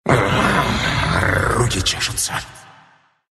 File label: move pain, ru; Monkey crown